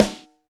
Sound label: Snare, Alesis DM5 Drum Machines